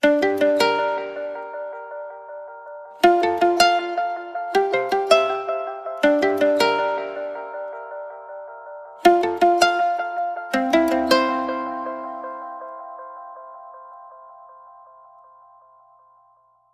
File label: Ukulele Sting